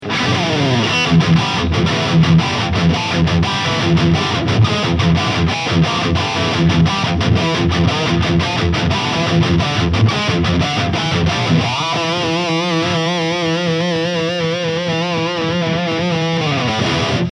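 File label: LTD SC 20 High Gain, guitar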